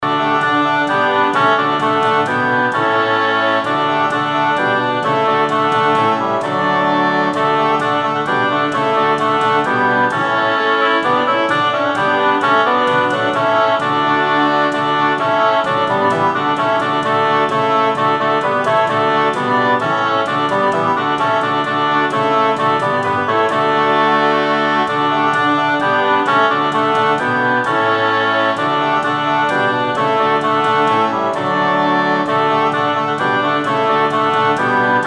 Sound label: screaming shawms; classical-music